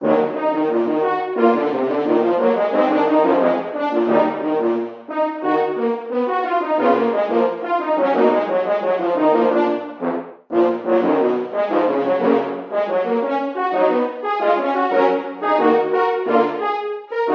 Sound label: Horn; The Bard's Tale Remastered Trilogy